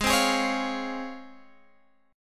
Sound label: C sharp Major 7th